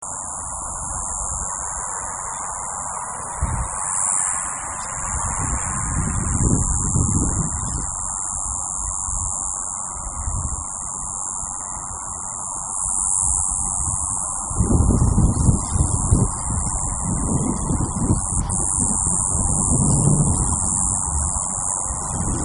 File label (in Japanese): kubikirigisu